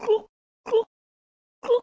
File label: drowning; toadette; SM64 CO-OP